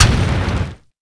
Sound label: impact wall; Quantum Redshift